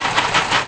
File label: machine